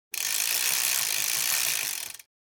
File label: Bicycle freewheel spinning